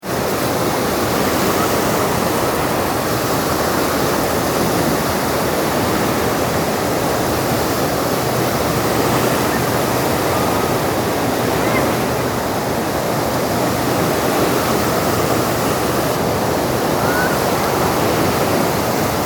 Ostseerauschen

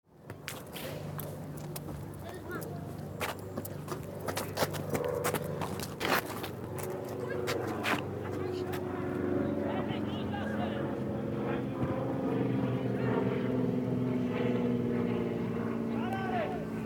Fussball Jungendtraining Schritte

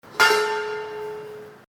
Autowerkstatt Metall Gong

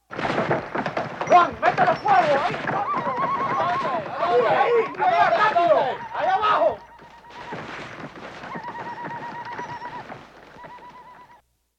Kampfszenen Spanier Zu Pferde Auf Indianerjagd

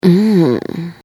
stoehnen

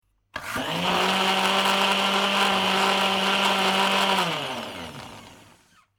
Mixer langsam

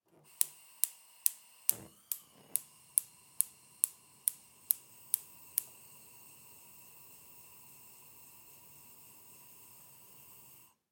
Gasherd

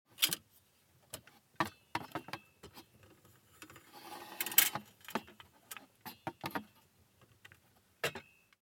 Notenstaender ausklappen

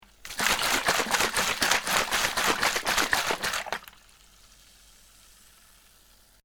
Flasche schuetteln

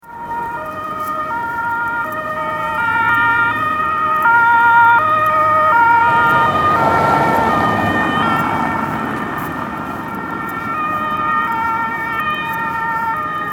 Polizei

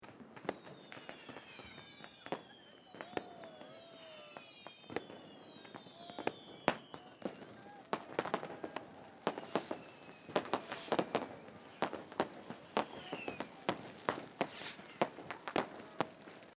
Silvester Atmosphaere

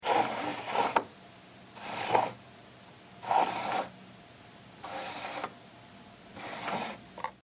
Maus schieben

